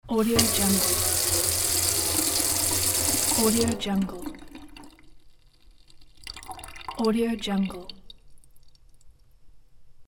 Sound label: pouring water into kitchen sink